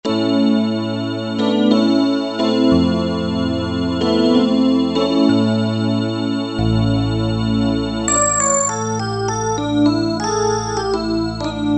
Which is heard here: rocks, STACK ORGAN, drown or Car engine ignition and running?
STACK ORGAN